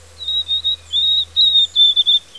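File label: White-throated Sparrow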